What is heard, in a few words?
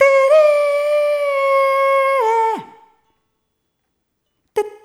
Rhythmic A